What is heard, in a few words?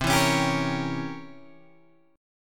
C 7th Flat 9th, Guitar, Open G